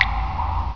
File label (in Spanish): goteo